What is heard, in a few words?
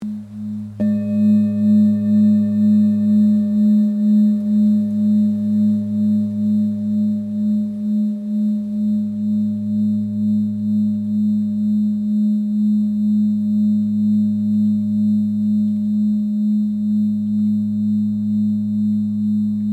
himalaya, tibetan singing bowls